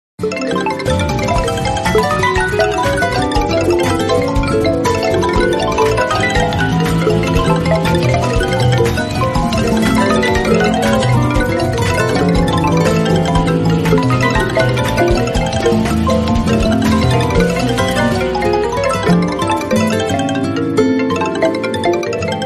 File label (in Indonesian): nada dering campuran